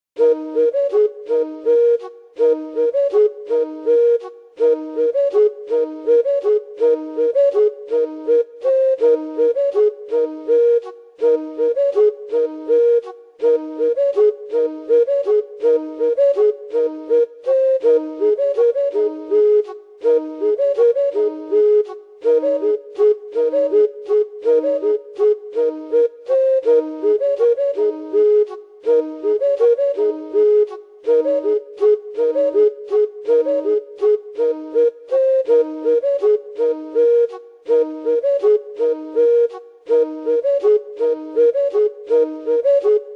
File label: minimal